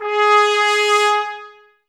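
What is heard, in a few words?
trumpets G#, brass; Miroslav Vitous; Woodwind Brass Ensemble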